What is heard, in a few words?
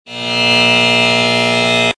game show buzzer sound effect